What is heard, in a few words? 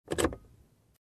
Locking Car